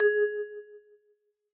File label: G#5